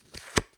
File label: Taking Card